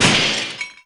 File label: Enemy Glassy Common Delete; gamerip; disney star smash; android